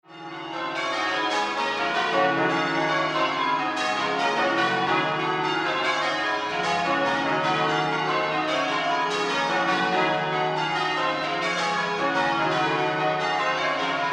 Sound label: Cathedral bells Yorkshire Surprise Maximus; Sheffield